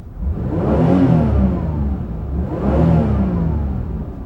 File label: engine rev, Transportation; E-MU Producer Series Vol. 3 – Hollywood Sound Effects; Crash Engine